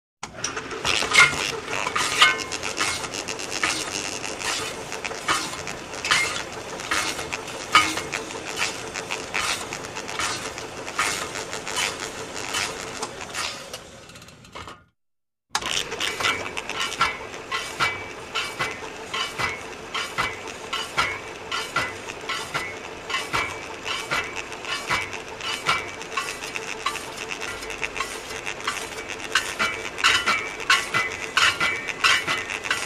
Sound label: Electric Fan Antique